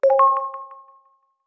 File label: Wifi New Wifi Available; Alcatel Pixi 3